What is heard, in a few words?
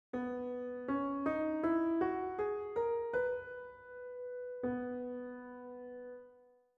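B major scale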